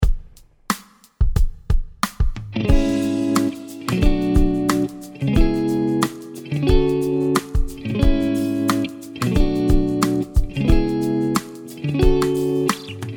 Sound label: A Ionian Tonal Center; guitars; key centers vs tonal centers